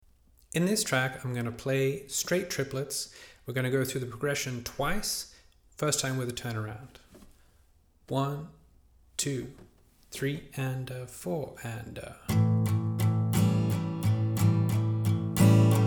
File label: Backing Tracks The Shuffle, 12BB